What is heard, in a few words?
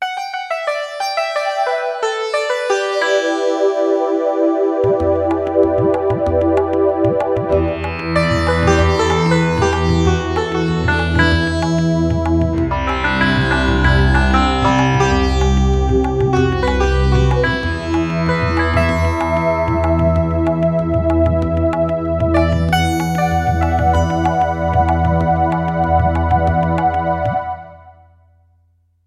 Indian World